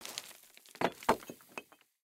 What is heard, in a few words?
earth Sym Idle Anim; elements